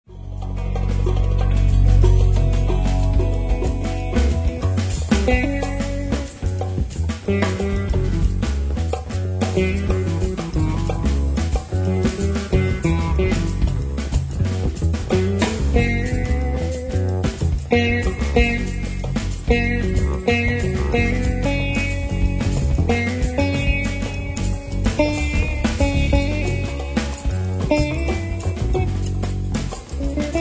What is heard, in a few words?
Guitar solo